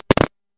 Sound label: crumbling block, platformer